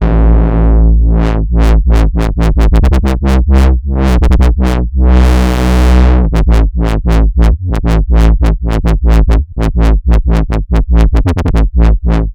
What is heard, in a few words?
Moog Messenger; 合成器